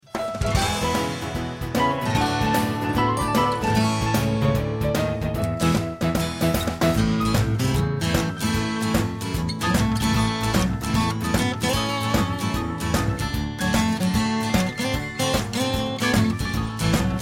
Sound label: MARRIS J-220M